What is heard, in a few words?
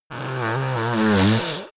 yeti nose blow strong